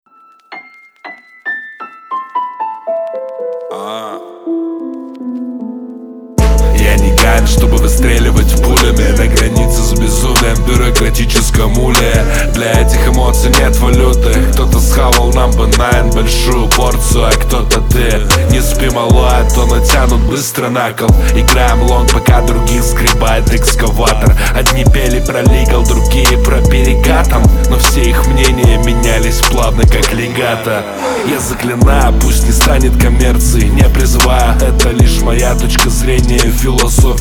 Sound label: legato